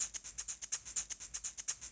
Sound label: Percussion